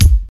trebly bass drum sound a sharp key, kick drum single shot tuned to the A# note